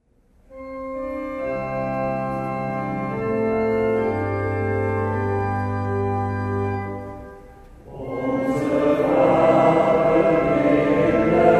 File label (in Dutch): Onze Vader; 140419 Paaswake